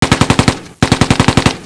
MG34; Close Combat

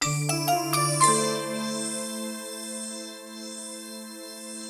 Synt

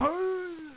Ahee; Blake Stone

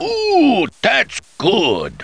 ooh

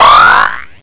Power

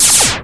LASER; Shadow

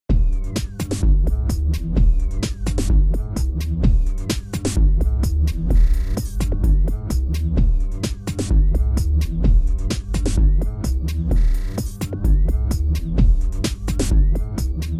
loop sundaze; WMBonusXP

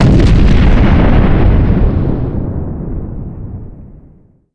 exp norm; HG2DEMO

